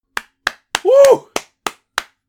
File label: Elderly man happy cheers and applause; misc human